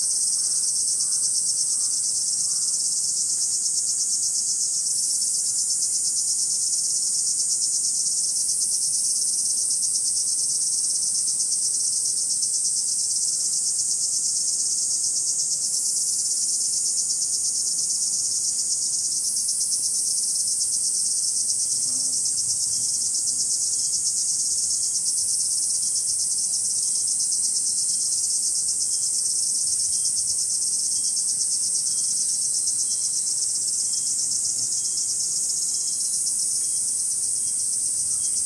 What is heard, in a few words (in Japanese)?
kuroiwazemi